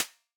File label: hats C, hip-hop drum kit